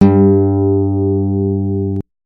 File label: tab ex 3 octave, guitar